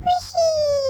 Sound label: jump